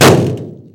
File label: metal sheet impact hard; physics